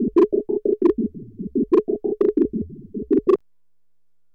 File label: RATTLE